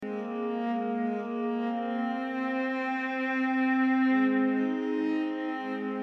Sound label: Violin Loop Original; M4L Bulbs; Szymon Kaliski